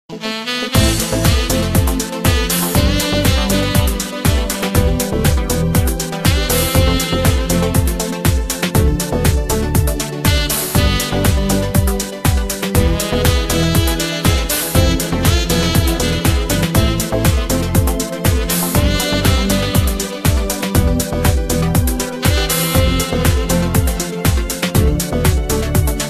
Saxofon

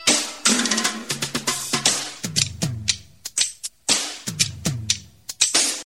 Drums

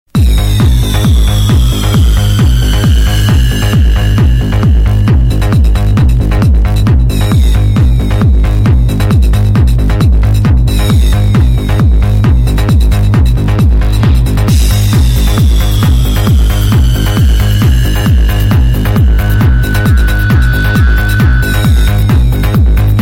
New Rock Music

